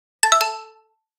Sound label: Xylo In; Yota Yotaphone